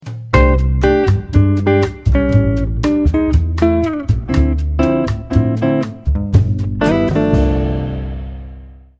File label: Bossa Sms